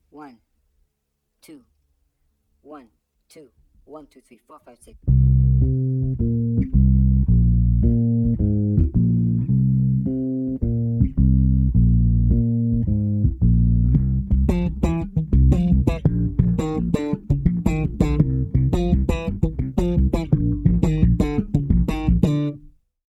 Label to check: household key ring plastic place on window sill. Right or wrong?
Wrong